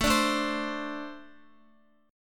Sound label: A Minor Double Flat 5th A, Guitar; Ambb5 strummed; x,x,9,7,9,8; 4F,4A,5C,5G,5C,6E; JGuitar